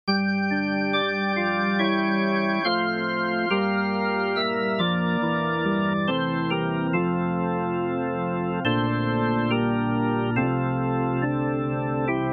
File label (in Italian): Luce splenda nella notte 3 voci Contralto